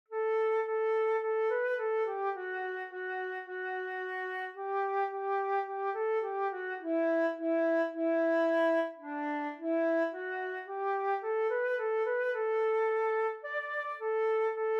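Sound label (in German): Lasst uns froh und munter sein Querflöte solo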